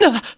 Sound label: little moan; adachi